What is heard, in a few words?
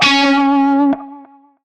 Shawzin Flourish Oct Three C Sharp; Music; Warframe; Narmer Shawzin